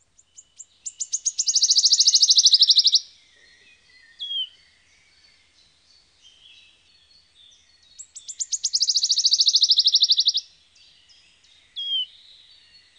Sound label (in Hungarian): Sisegх fьzike; Madarak